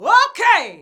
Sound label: VOX VOX; Sound & Vision - Gigapack II CD 2